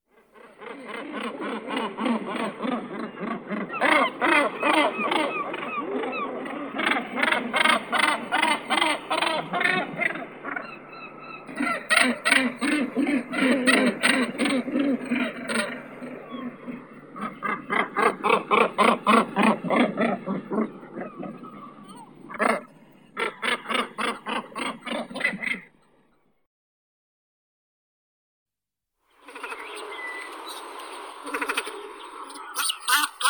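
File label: great cormorant, phalacrocorax carbo; baklan bolshoi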